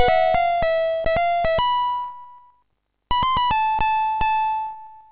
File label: tango; The Music Studio